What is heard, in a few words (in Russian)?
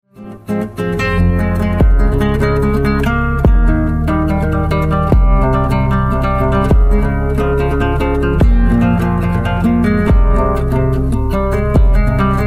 гитара